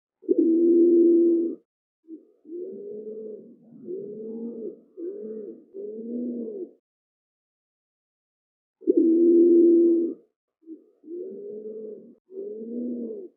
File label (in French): Pigeon des Comores, oiseaux de Mayotte; oiseaux terrestres; faune de mayotte